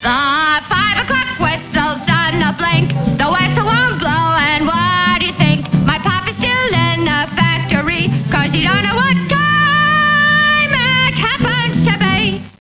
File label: song; Loony Tunes